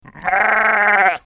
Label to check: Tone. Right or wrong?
Wrong